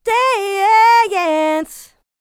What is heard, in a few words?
licks, vocal, dance; ILIO; Vocal Planet